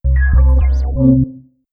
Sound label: hngahuwheewhoum; Truth-Hz - 1-Hit KO Sample Pack